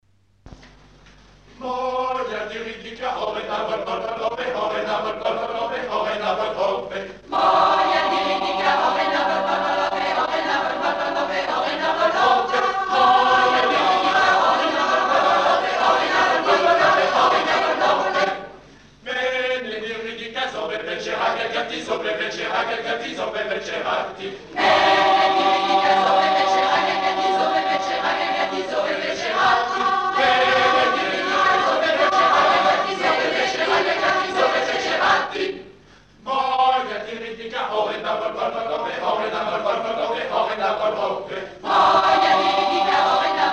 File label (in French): chant yougoslave; concert du 12 mei 1965